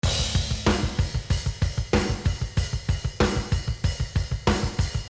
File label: Drum After Clean